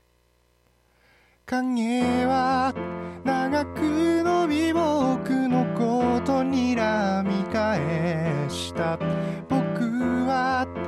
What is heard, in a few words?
bass guitar